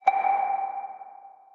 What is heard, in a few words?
chime